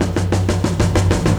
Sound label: DRMROLL; E-Lab; X Static Goldmine 2; akai